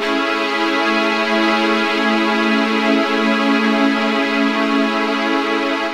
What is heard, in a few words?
Cream Pad (maj7th)